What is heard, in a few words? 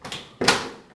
door metal open; Max Payne